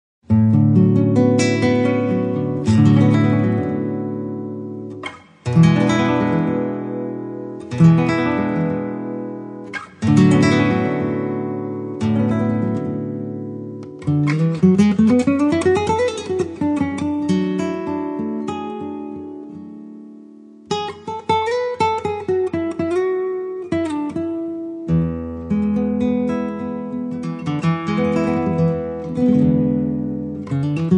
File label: Solo Guitar flamenco intro; Acoustic Guitar; Julian Catford